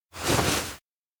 bow head; spica; anemoi

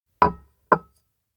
cup place desk; anemoi

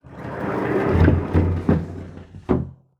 door close home; anemoi

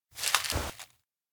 hotdog eat; anemoi

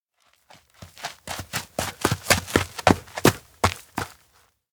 steps outdoor ground chase; rikka; anemoi

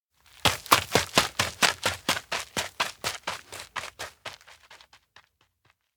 steps outdoor ground run start; rikka; anemoi

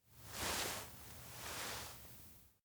nod small; anemoi

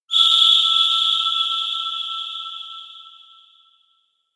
elec grasswhistle blow two; anemoi

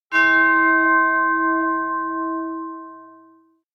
bell strike; anemoi

